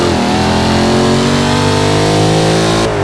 third, vehicles; gmod; tdmcars; jag ftype

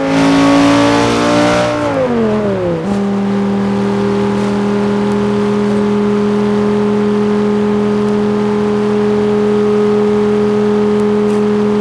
fourth cruise, vehicles; gmod; tdmcars; gallardo

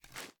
reload empty fast start, sh; gmod; wfoly plr sh charlie725

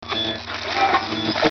adjust radio; gmod; perp2.5

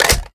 reload fast mag in

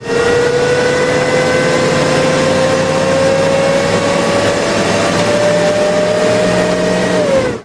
fourth cruise, vehicles

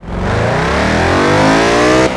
first, vehicles; gmod; tdmcars; sls amg

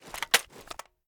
ar reload fast empty rotate; weapons; gmod; viper; scharlie

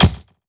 frag physics bounce dirt hard; gmod; viper

